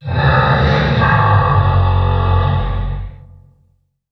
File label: moan; gonarch